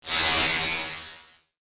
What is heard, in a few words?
robot